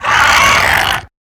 Web Sling; Lurker; Evolve; Gorgon

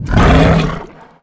Exertion Mortar; Evolve; Behemoth

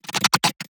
Emote; Evolve; Gorgon

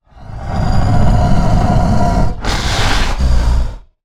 Pain Light Breaths; Evolve; Goliath

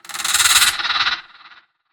Lurker Sniff; Evolve; Gorgon

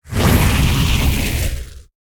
Flame Breath FLAME; Evolve; Meteor Goliath

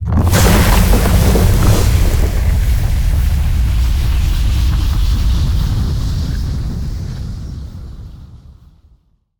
Mortar Cannon Flame Puddle; Evolve; Behemoth

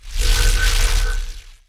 Grounder Glide; Evolve; Kraken

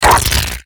Exertion Light; Evolve; Gorgon

